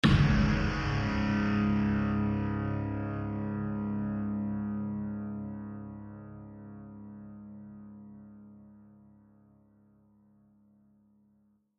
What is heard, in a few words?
finish riff